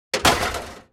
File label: Drop Fall Metal Rattle Scrap Debris; Mechanical Wave; Undoing-Computer